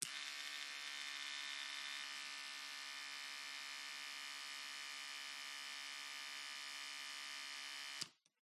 glitched tones household bathroom grooming electric razor on off buzz fast low battery